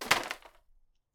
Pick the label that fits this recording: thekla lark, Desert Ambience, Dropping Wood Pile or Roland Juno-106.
Dropping Wood Pile